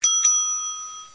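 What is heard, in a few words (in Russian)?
два раза нажали на звонок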